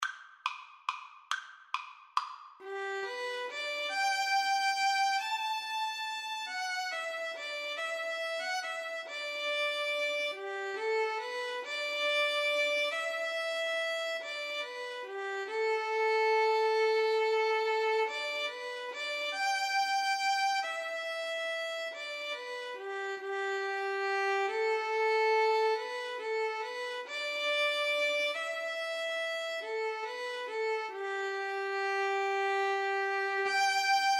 violin duet

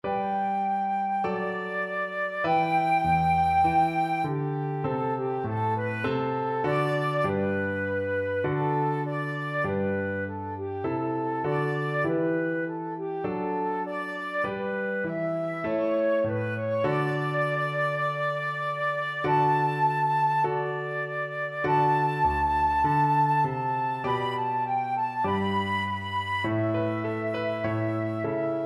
March, flute

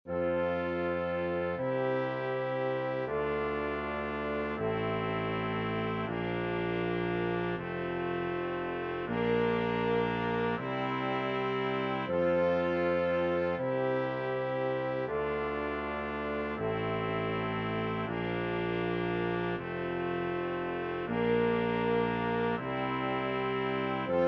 canon easy, brass quintet; pachelbel canon

